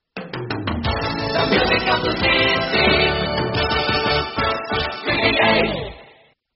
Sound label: jingle; australian radio 3ba